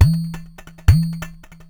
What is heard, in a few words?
Crushed Perc (140BPM); musicradar